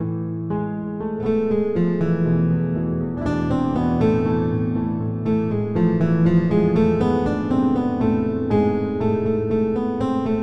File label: guitar